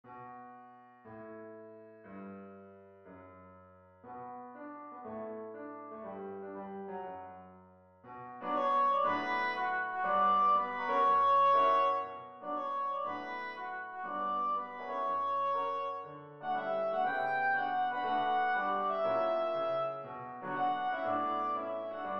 swing

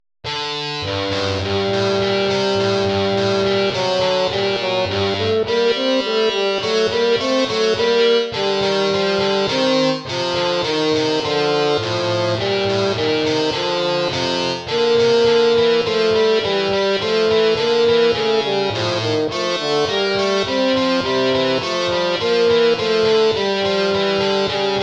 courante; haendel georg friedrich

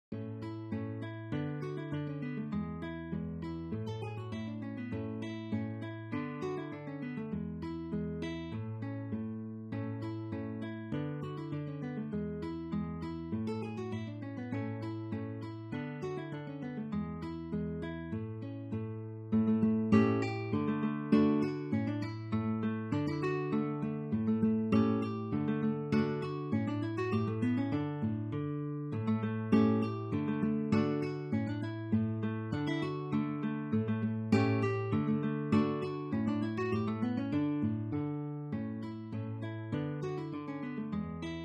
schottische; Nightingale

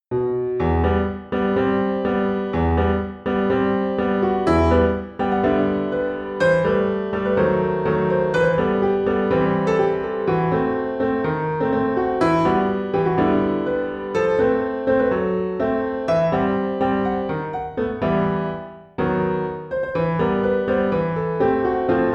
tango; interdit

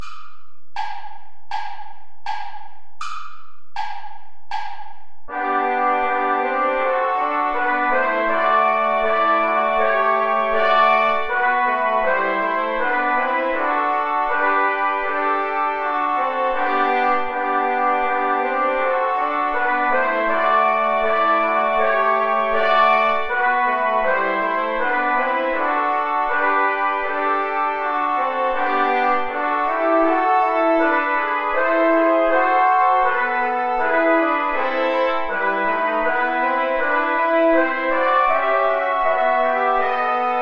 play along without trombone

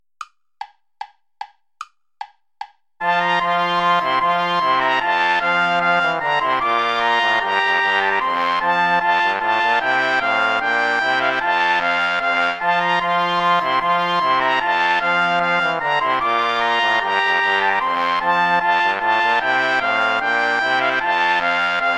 bourree play along without trombone; praetorius michael iii

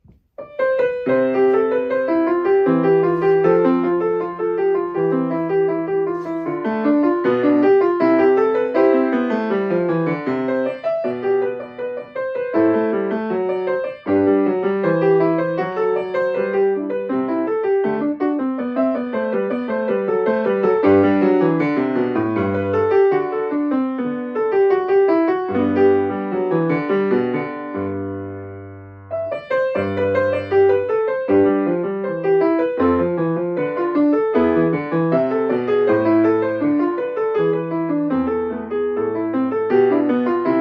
prelude

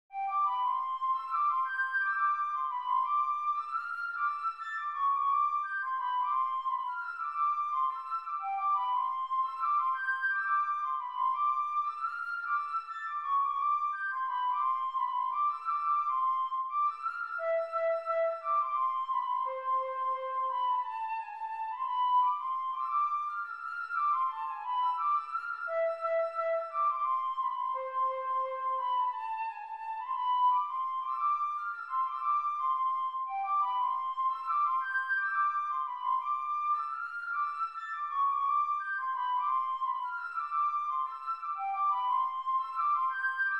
courante

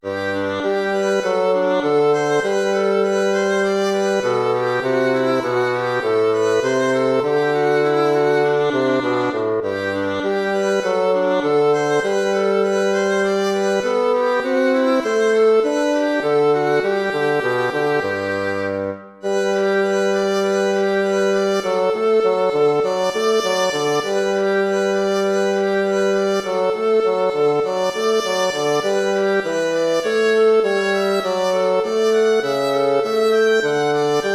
allegro moderato; hook james

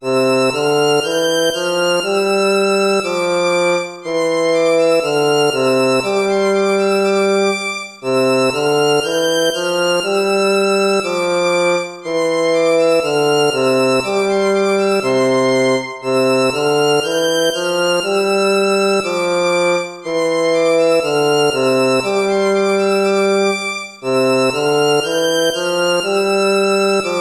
gavotta; hook james

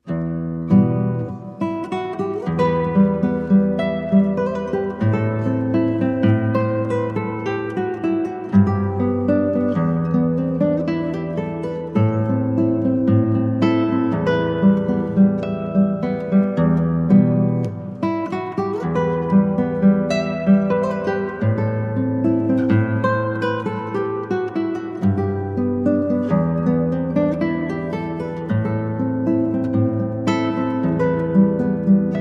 original work for classical guitar; out time

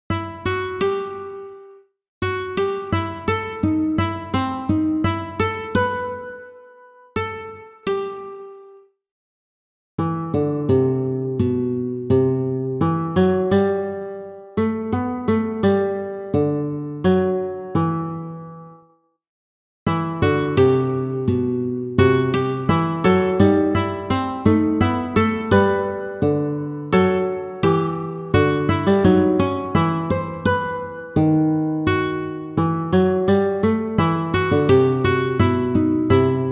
small contrapontic study

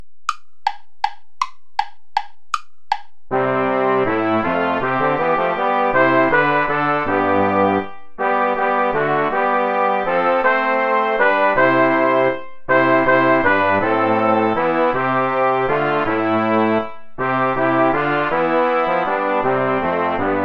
play along without trumpet; vulpius melchior; die helle sonn leucht jetzt herfa

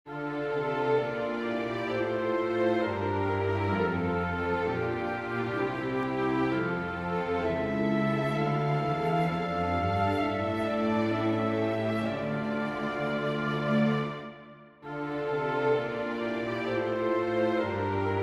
for winds strings; bach johann sebastian; befiehl deine wege

